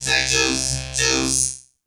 Processed Vocals, Loops

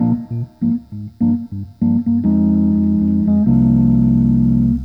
Organ

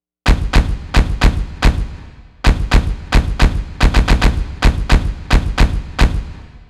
perc; pitchshifter PSI

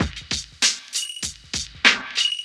Old School Break, Drums

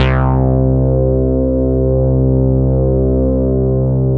MOOG BASS; SoundFactory

